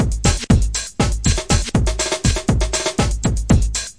thin breaker roller, 120 bpm, drum loops and breaks